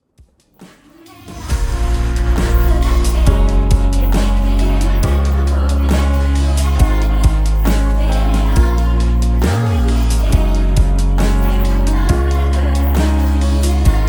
Beat Groove; Sanremo

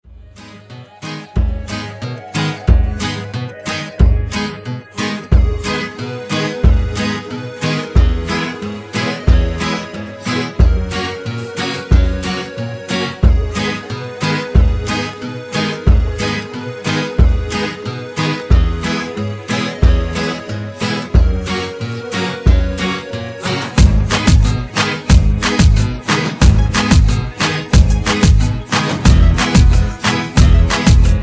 Zumba

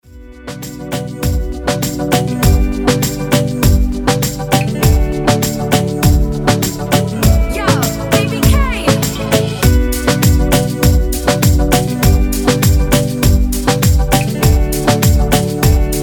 Kizomba

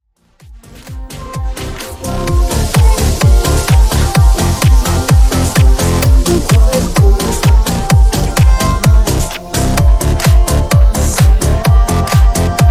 Dance Remix

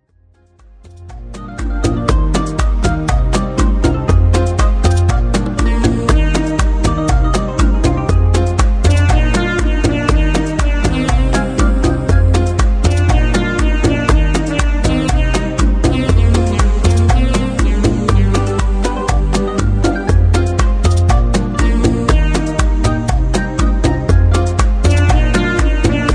Bachata

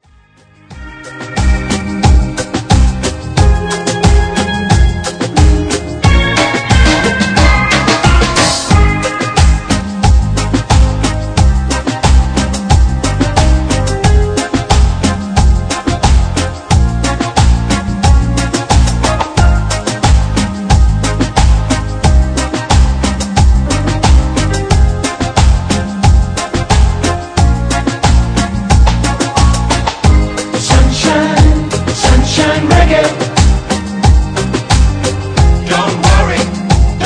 Reggae Cumbia

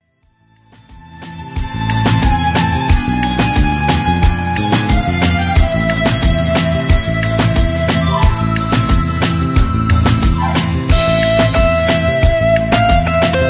Bajon

